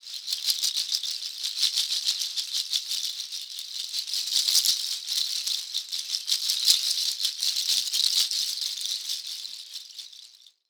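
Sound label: shaker, Synclavier Percussion, World & Orchestral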